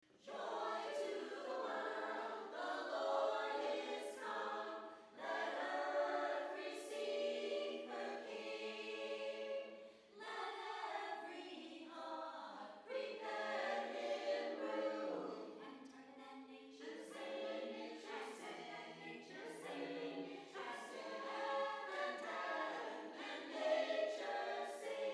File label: Joy To The World